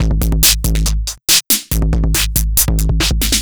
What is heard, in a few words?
Noise Beat; Drums & Drones